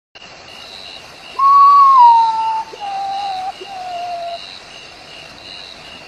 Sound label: nyctibius